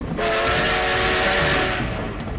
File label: train; torchlight